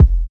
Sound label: studio kickdrum sound e key